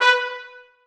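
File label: trumpets staccato a#4; Sonatina Symphonic Orchestra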